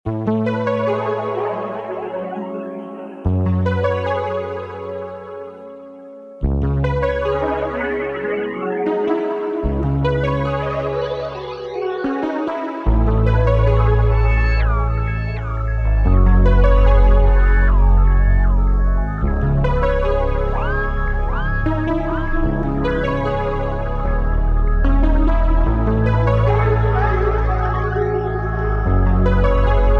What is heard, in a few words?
150bpm C minor